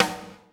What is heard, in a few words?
LUDW SN, ACOUSTIC KIT; Greytsounds VOL-1